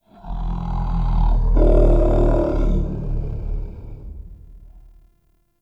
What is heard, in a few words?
Root Cave Ancient Tree Groan; Ambience; One Offs; Neathuns Root Cave